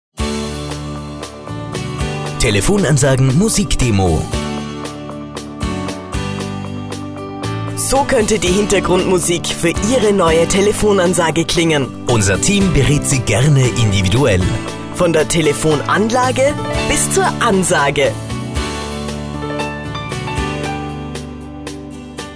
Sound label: Slow